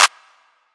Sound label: Clap